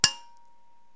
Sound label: Pick Axe